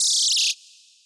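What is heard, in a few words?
laser beam; Laser Beem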